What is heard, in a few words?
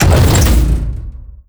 bump, waste pit lift